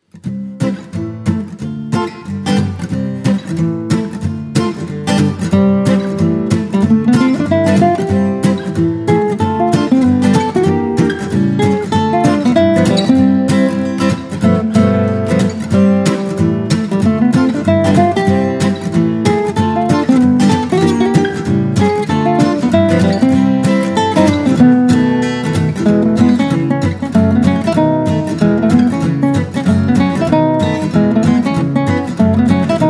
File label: swing